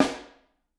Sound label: Snare Overhead